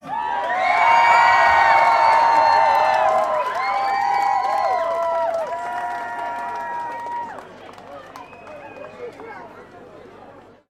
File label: Applause Big Crowd